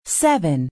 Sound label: Call; LG B470; Seven